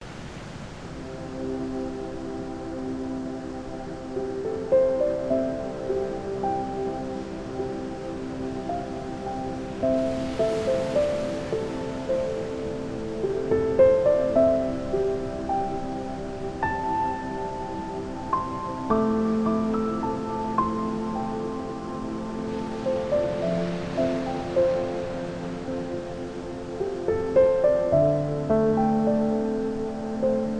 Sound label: Relaxing Time